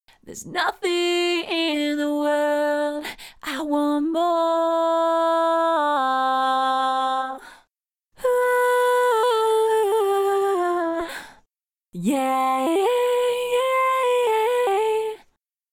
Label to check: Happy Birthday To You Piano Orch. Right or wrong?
Wrong